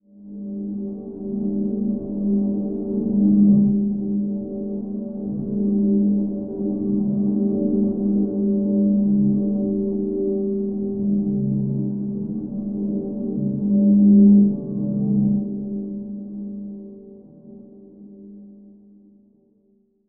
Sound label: Transition Stab Dark Musical Tonal; Production Element